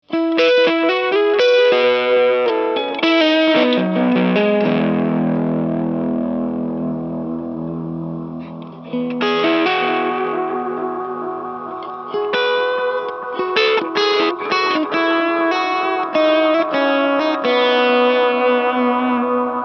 zerre warp

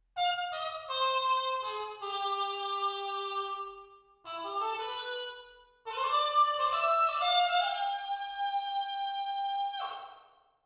Trompete EMT250 Solo

